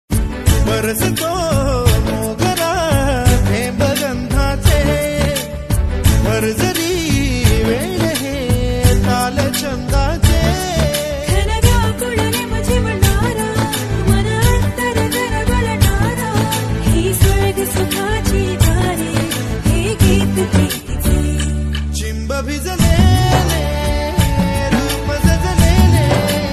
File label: marathi